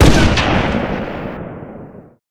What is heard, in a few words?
sniper rifle charged fire; weapons